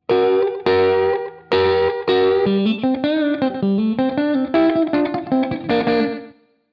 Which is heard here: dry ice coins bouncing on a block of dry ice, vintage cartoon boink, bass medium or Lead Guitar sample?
Lead Guitar sample